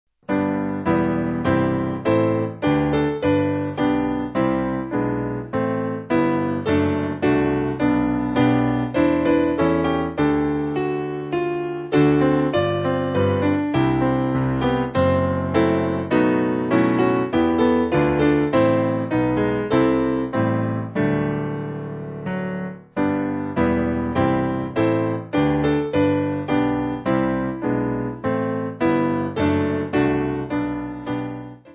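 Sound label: Piano, church music backing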